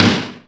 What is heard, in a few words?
Snaredrum, Korg T3